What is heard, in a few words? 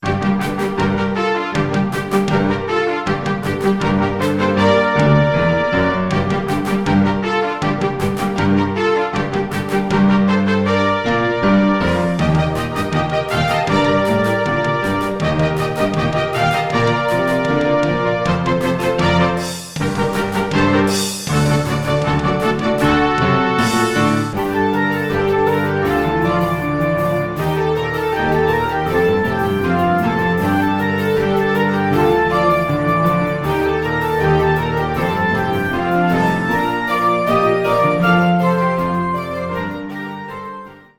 Trumpets voice